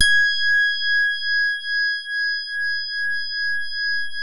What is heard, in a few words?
JAZZ MID G5, E-PIANO; Music Store